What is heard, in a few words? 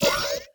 Misc UI; Unending Dusk